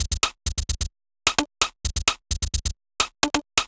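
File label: Odd Glitch, 130 BPM, Percussion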